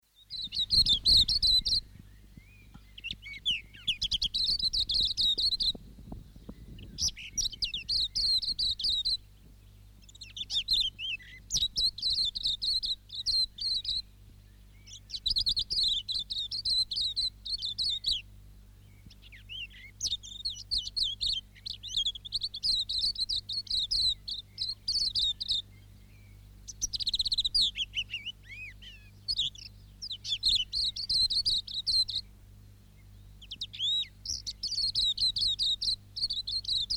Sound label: silvereye song, birds